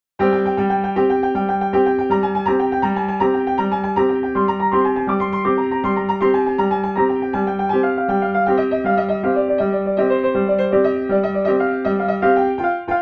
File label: Chaharmezrab e Zabol Segah; Moshir Homayoun Shahrdar